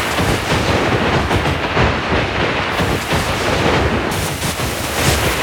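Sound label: snowball loop; tusk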